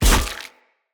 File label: Sphere Polearm Medium Impact Flesh